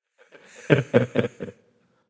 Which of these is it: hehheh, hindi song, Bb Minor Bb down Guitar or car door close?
hehheh